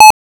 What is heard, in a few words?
beep